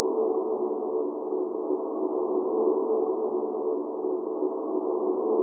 Cat Ambiance

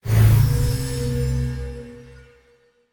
magic swoosh

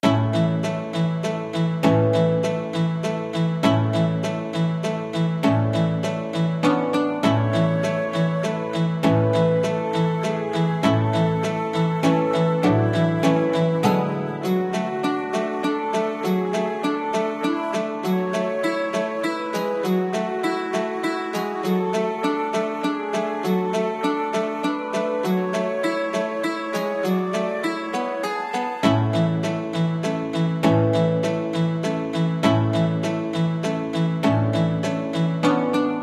Medieval Village Theme; A Timely Intervention

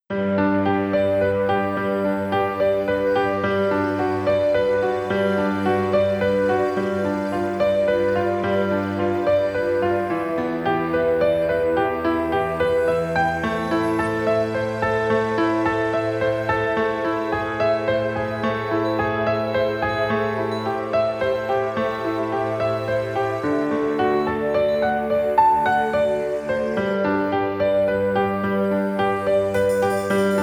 Affectionate Song (Placing in the Piano Melody); Doki Doki Majo Shinpan

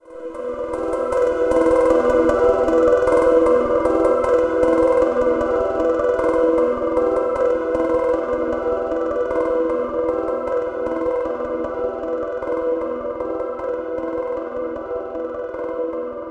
Gridnode Overlay Tone; Downtown

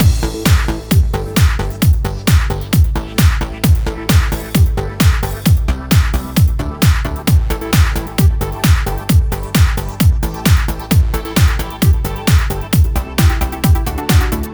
Funk Intro; Funklift

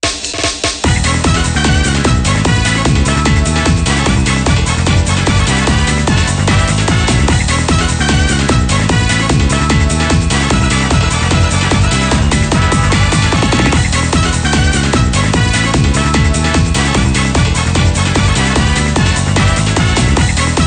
Music Game General event

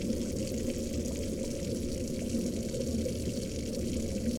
Panel Water Fill; Among Us

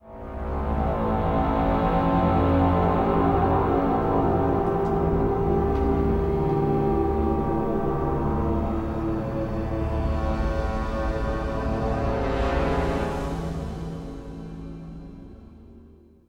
Before action Ghost lead; Music Shorts

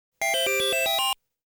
Extend Sound; Xevious

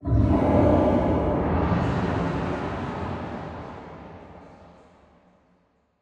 City Ephemeral Flush

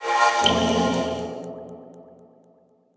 Forbidden Droplet

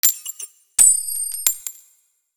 Duel Entry Coin

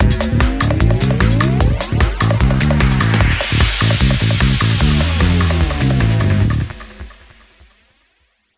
Victory Dance; BattleSloths

